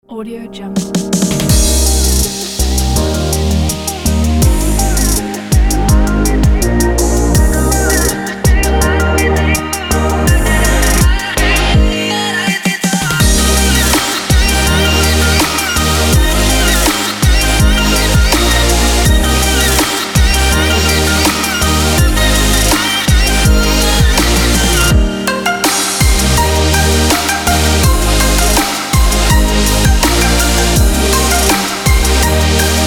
future bass

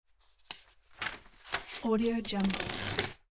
page tear

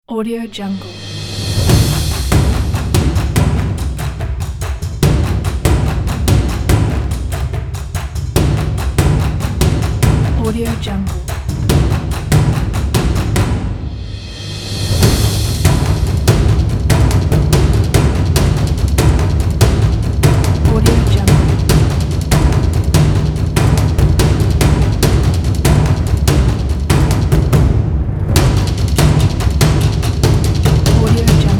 taiko; pilotaudio

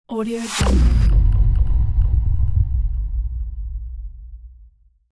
subversive blow

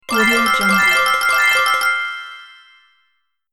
sparkle magic sequence; sonic-boom

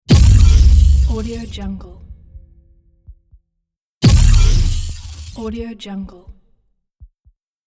glitch hit

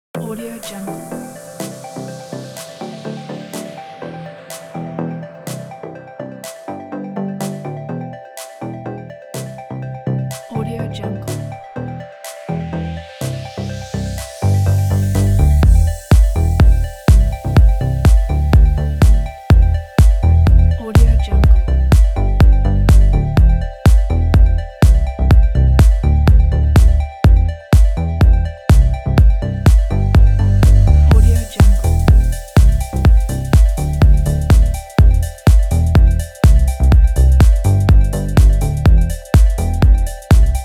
fashion show; mehilovesound

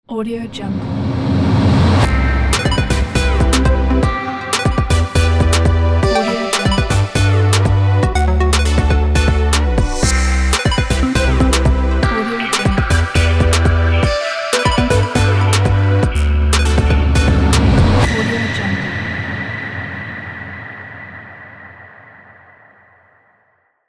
glitch logo

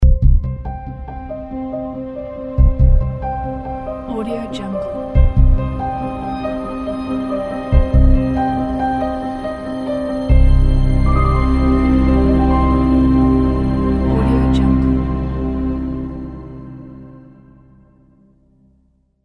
disturbing cinematic logo

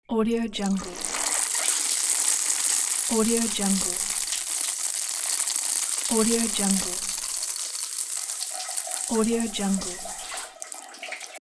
shower; premiumstocksfx

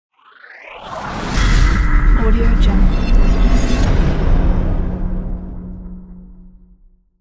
magic spell

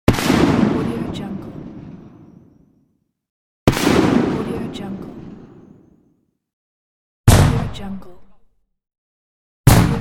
petards

